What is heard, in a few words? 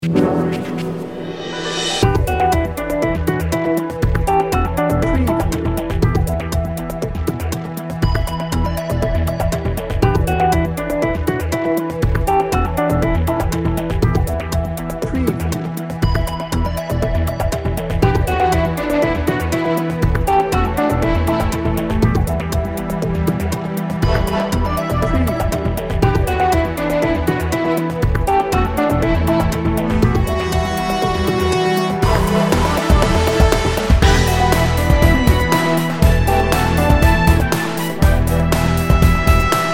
global adventure